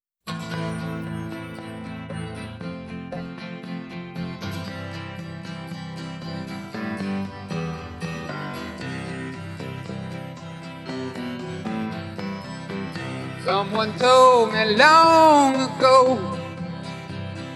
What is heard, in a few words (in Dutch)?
Voice Gitaar